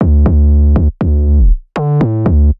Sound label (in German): Durchgangsnoten; 808; 808 Producing Guide